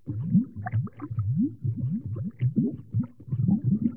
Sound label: Lava Bubble; ambience